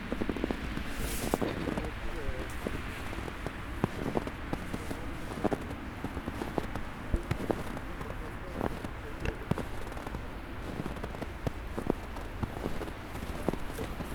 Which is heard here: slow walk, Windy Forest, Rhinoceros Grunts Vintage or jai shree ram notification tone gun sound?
slow walk